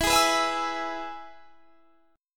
E-Major E; strummed; 24,23,21,21,21,24

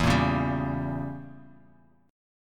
E Diminished 7th E, Bass Standard

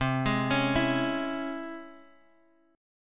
C sharp Minor Major 7th, chord

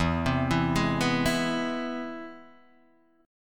C Augmented Major 7th E

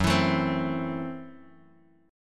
F sharp Minor 7th Flat 5th F sharp Guitar Open D

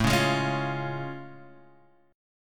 C sharp Major A

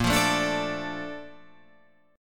Bb Major 7th, Guitar, Drop D

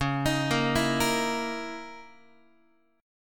C sharp Minor 6th Add 9th C sharp, Guitar; C#m6add9; arpeggiated; JGuitar

